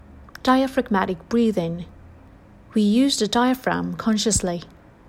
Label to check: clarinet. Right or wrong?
Wrong